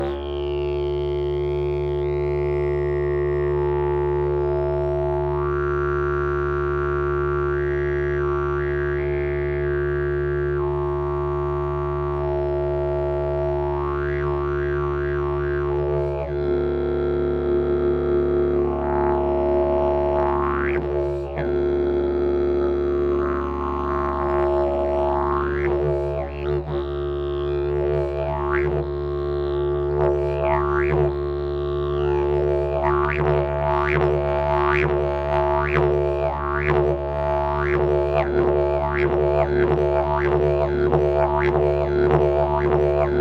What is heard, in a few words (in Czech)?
olse hrandala, didgeridoo